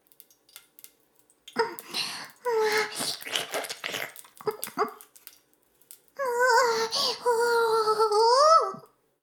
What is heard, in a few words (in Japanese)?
フェラ音声; 古き城のドラゴニート2